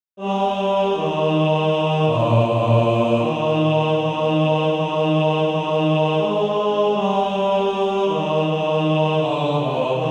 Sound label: Male Choir; He Leadeth Me